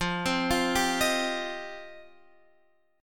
F 7th Suspended 2nd F down Guitar Half Step Down; F7sus2

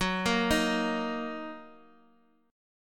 Gb 6th Gb down Guitar Open D

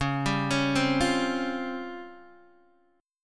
Db Major 7th Flat 5th, Guitar DADGAD

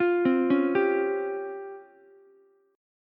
Db Major 7th Flat 5th, Ukulele; DbM7b5 arpeggiated; JGuitar

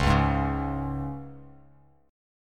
C sharp 5th, Bass Standard

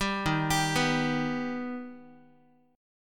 D sharp Augmented D sharp Guitar; D#+ arpeggiated; 10,11,10,9,x,x; JGuitar

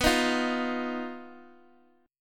B Major Flat 5th, Guitar, DADGAD

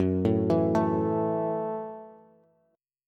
F sharp Minor 7th F sharp, Bass

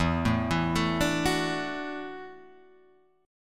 E 7th Suspended 2nd Suspended 4th, down

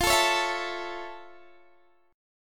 F Augmented Major 7th, Guitar, Half Step Down

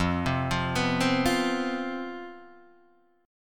C Major 7th Suspended 4th, F, guitar chord

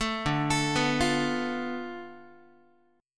D Minor 6th Guitar; Dm6 arpeggiated; JGuitar

